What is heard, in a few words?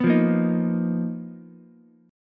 B Major 7th Flat 5th B down Ukulele; BM7b5; strummed; JGuitar